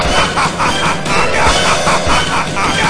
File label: haha; metal slug